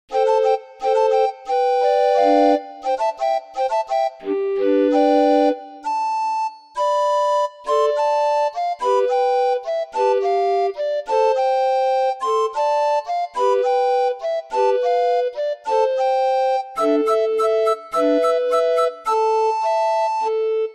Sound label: orgue barbarie